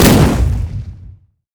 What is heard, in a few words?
crash boom; LoL; corki